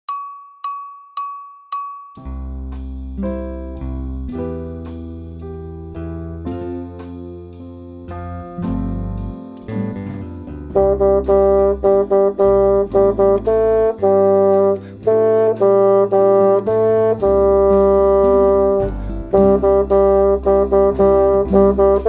basson

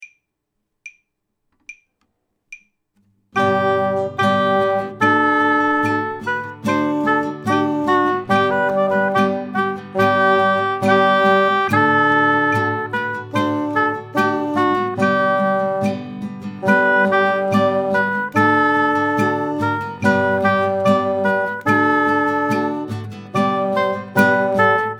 duo mozart complet, basson

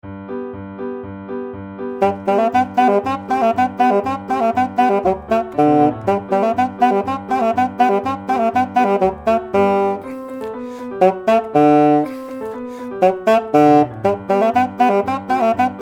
polka basson sol 120; polka czech

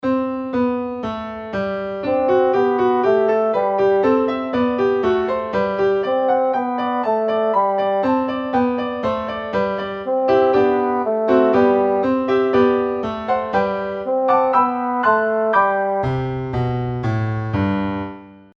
ostinato, basson

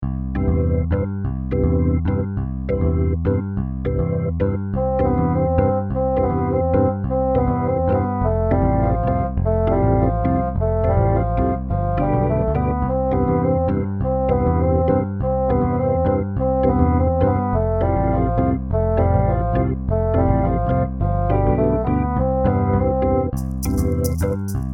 basson; methode basson

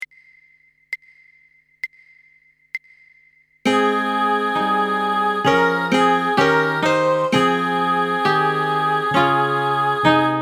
roi thai solo, saxophone

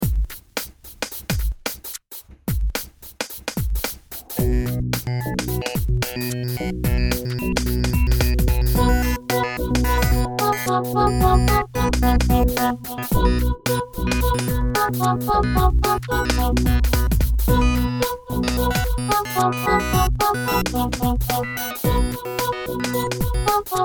basson

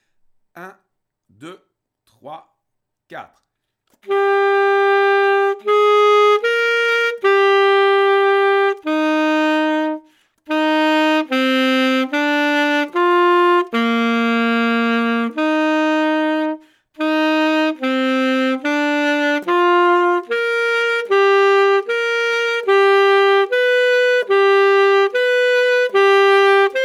duo des nomes sax

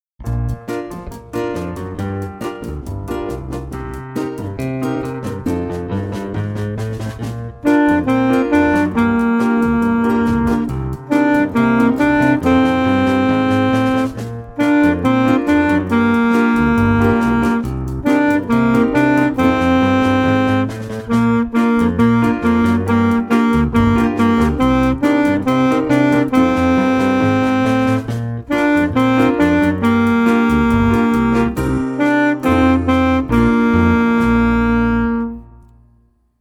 saxophone; mib

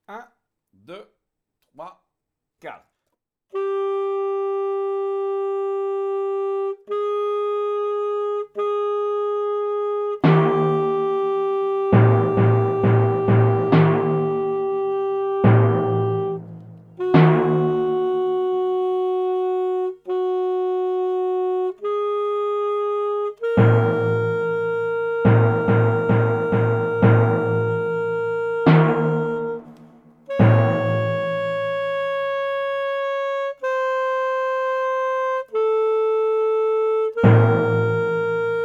marche, saxophone

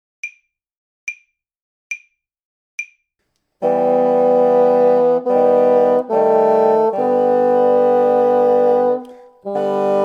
poeme 3 bassons tutti; poeme trio

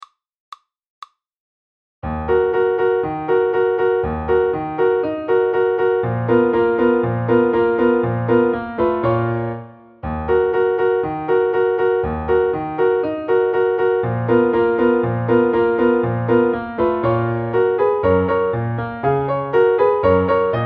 marche turc facile 120, saxophone; mib

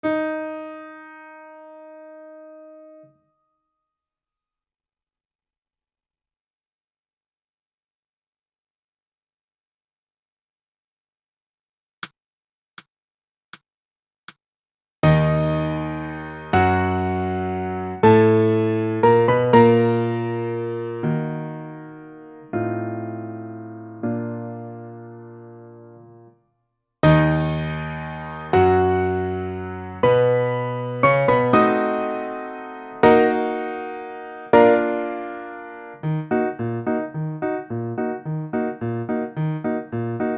fantaisie, saxophone

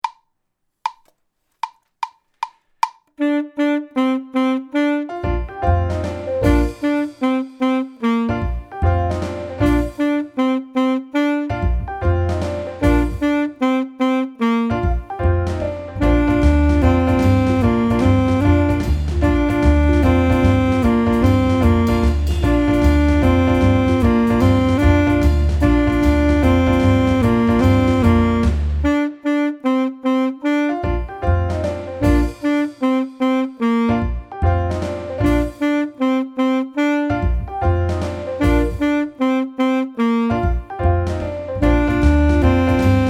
saxophone; mib

